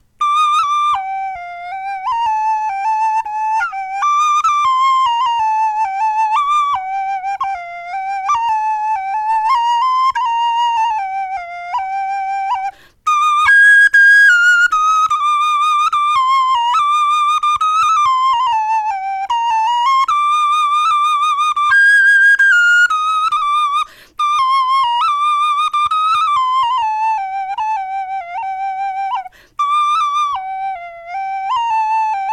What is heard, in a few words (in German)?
melodie, knochenfloete